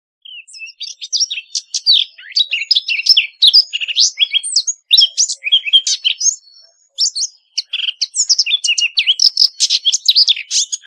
CAPINERA SUPER EXTRA

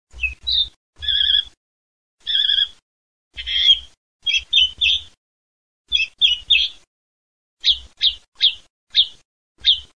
tordo, birdsound

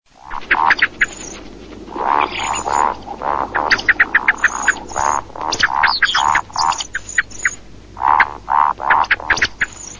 beccamerlo

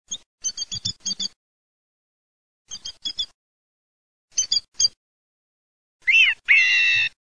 TORDO

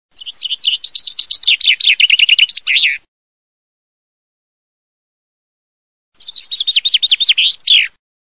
FRINGUELLO, birdsound

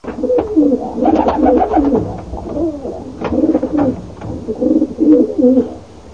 PICCIONE, birdsound

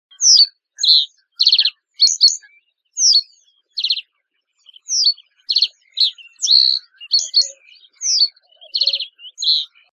Migliarino di palude

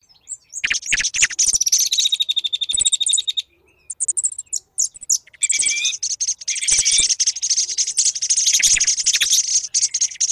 tordo

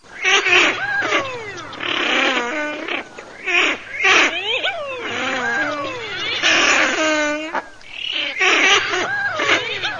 MORIGLIONE

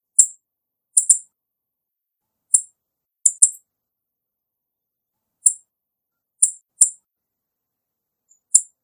tordo bottaccio

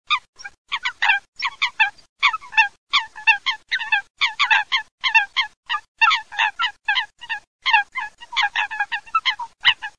folaga folaga, birdsound

